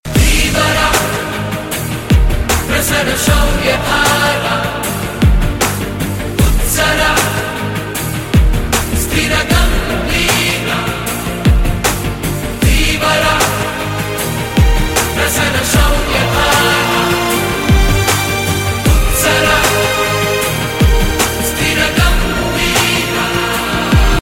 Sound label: Ringtone; Bahubali